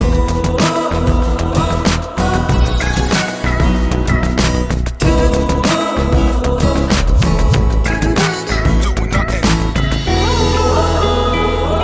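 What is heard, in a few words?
background music; Pachuvum Athbutha Vilakkum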